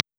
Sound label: Marshall 1982a Tone Tubby